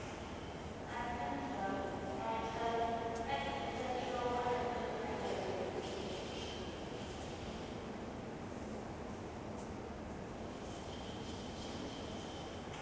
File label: station